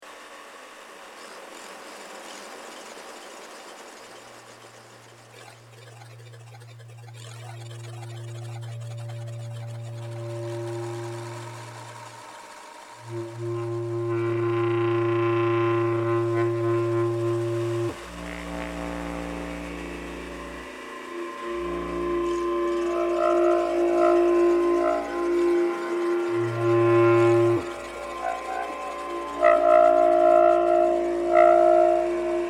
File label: waterbirds excerpt; bass clarinet, Bb clarinet, computer and wireless network infrared sensor system; Helmuth/Danard